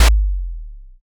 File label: taiko